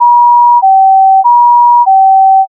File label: ambulance